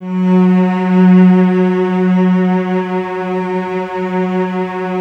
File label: cellos F#3, String Ensembles; Miroslav Vitous; 90 s Sample CDs